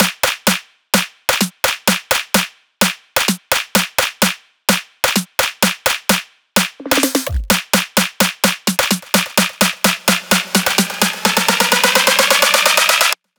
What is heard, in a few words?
House Buildup Drums 128 BPM; Loops; Cymatics; House Starter Pack